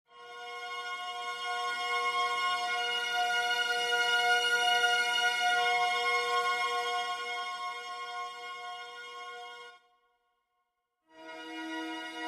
orch beauty nature; soundtrack

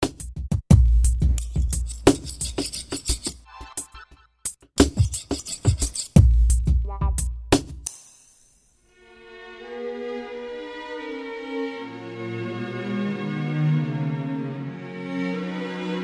orch twist, medium

